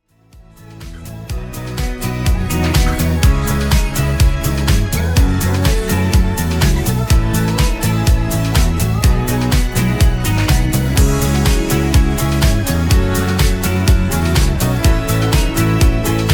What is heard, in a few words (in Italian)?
Ballo Di Gruppo Groove Continuo